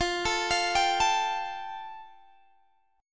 F Major Add 9th, Guitar, Open G

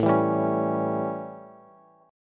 A 7th Bass, chord; strummed; JGuitar

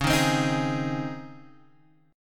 Db Major 7th Suspended 2nd Db down Guitar Open G

